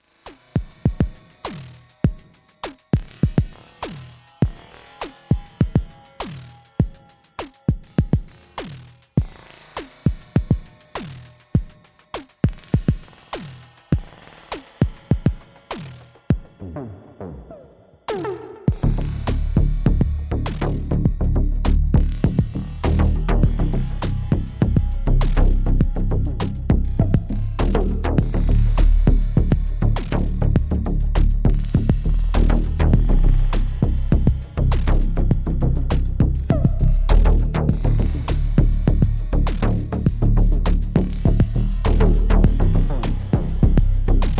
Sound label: lip chime